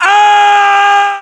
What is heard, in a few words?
death fall